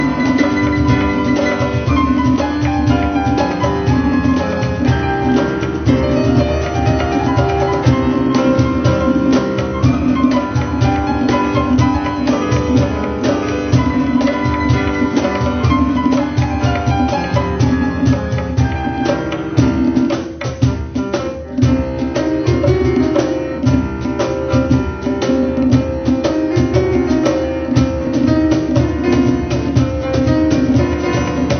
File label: Piano